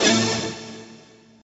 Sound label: hit, pinball; Excalibur